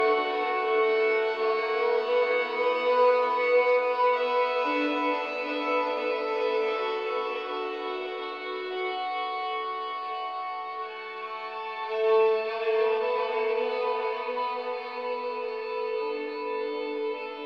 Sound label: Gift Reveal Conversation; burly men at sea ios macos ps vita ps4 switch windows gamerip 2016; The Udrost